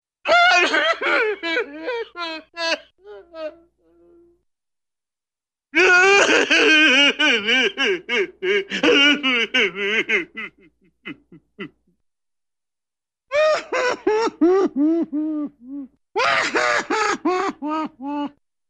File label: Funny Man Crying - boo-hoo; Hollywood Edge Cartoon Trax